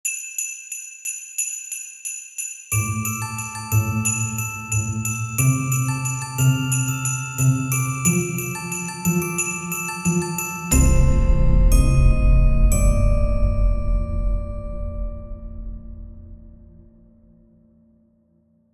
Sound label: Round Start; Call of Duty Black Ops Cold War - Die Maschine